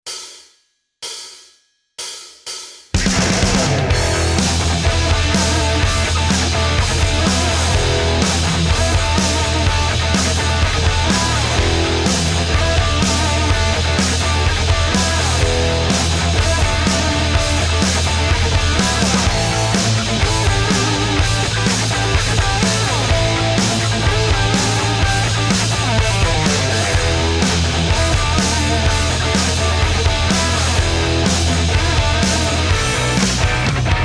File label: Blues backing, Guitar; Guitar Techniques 2019 Issue 297 July